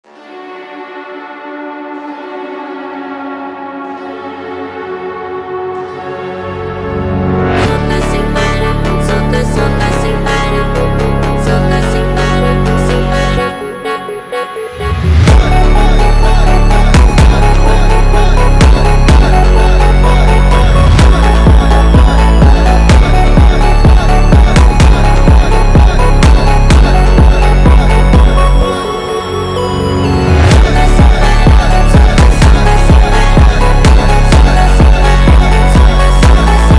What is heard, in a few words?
Brazilian phonk